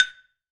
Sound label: R CLAVE, Drum Mashines; EdgeSounds; Drum Mashines VOL-1; R-100 DRUMS